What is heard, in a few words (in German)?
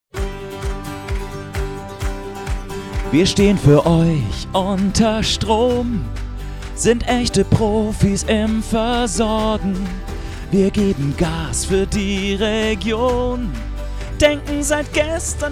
Telefonwarteschleife Pop Songschnipsel; Mysoundbranding